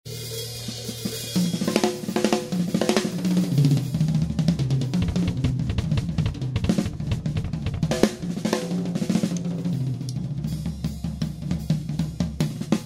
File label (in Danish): trommer